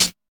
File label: good high hat f sharp; hat samples